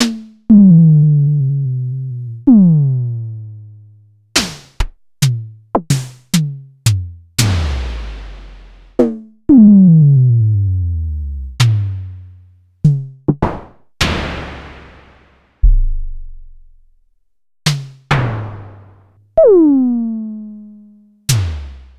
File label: Pearl Drum-X, Samples Sliced; m8